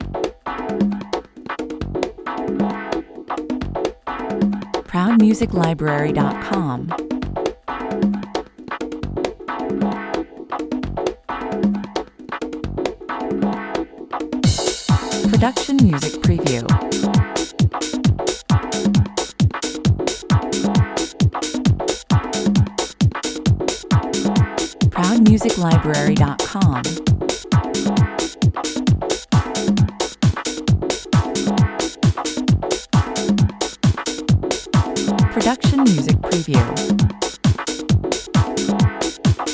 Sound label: funk 133 bpm